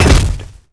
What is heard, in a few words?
jump; tankrat